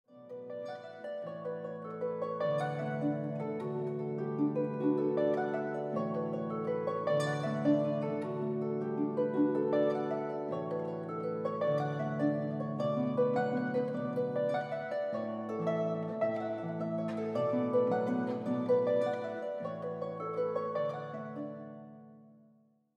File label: Tripping Up the Stairs Irish, harp